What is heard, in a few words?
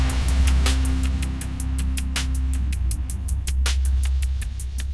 drum roll